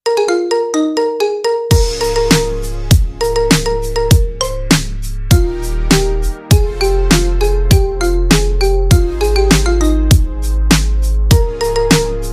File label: marimba remix